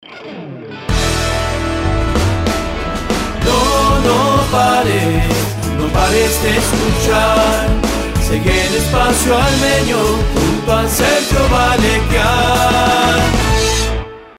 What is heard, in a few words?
jingle